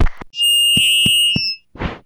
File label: Feedback Microphone Blow; SFX Kit; Foley - Misc